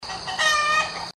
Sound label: chicken